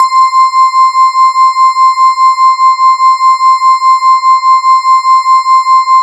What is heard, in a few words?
E-HARP, DX HARP; Wizoo - Powered DX